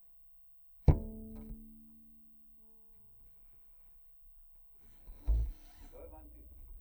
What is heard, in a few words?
C Bass DI